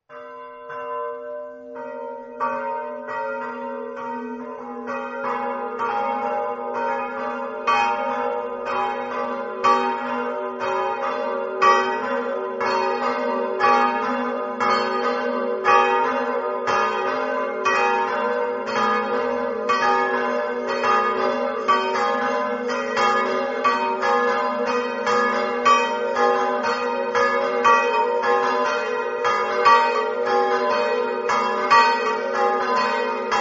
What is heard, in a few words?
campane